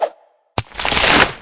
oak seeds; bravebark

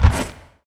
shadow dodge puff; hero; mirage

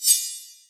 magic missile hit; hero nivus

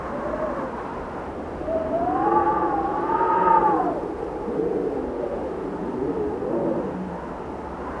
winter Age loop

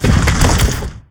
mountain door

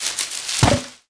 head scratch; crocs

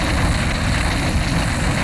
fire breath loop

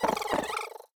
purr; httyd

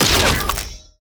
spiders tower cristalize bolt

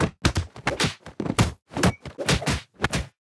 combat melee attack no sword loop

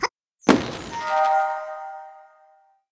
activate; simon

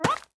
arborean Tap in; sfx

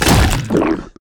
dragons enemy miniboss instakill; sfx

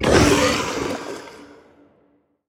plague cloud cast; heroes bonehart

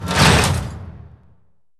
UI gate open

